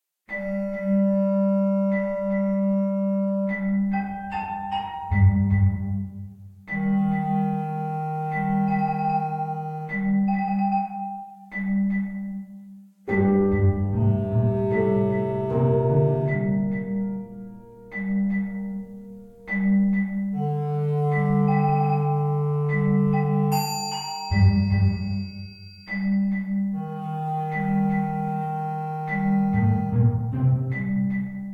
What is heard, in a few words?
(Orchestral) Playful Tension; game music